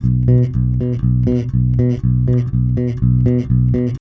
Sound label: 110 Pop Basic Bass B, E-Bass; Pop Rock